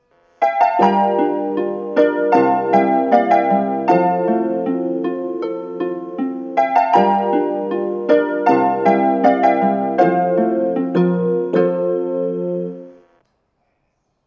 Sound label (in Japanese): 発車メロディー; 新橋